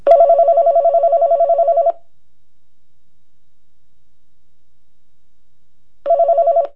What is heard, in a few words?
phone